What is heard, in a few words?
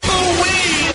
ooh wee, Voice